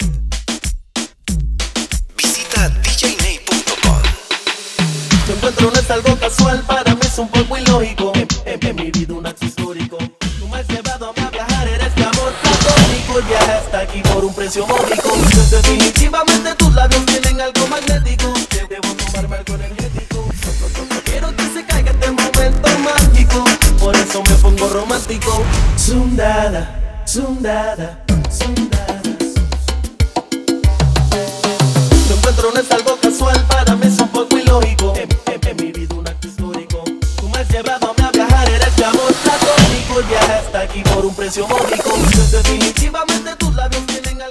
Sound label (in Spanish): Acapella Hype